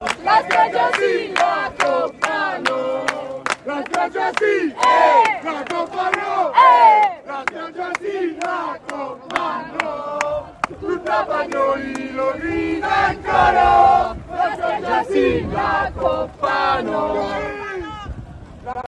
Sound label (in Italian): Cori; american cup